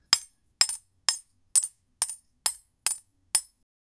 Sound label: wooden spoon clinks, culinary